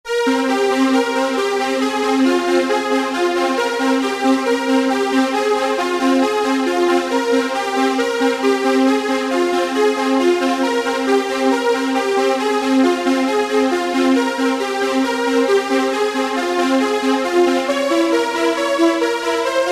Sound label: synthex rv2 strings